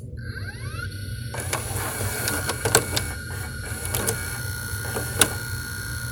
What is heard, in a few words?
tape loading